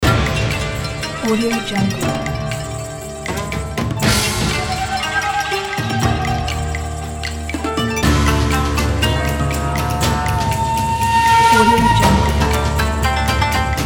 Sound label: ninja fight loop